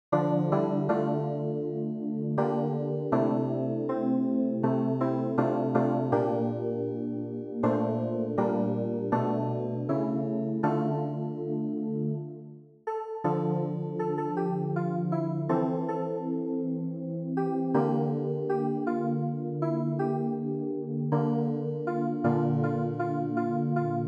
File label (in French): clavier; Garde Moi Mon Dieu